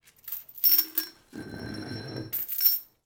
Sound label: Footsteps Chain